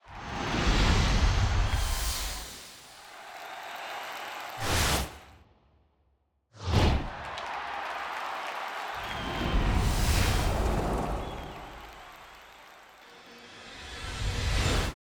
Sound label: SFX; Black Music Month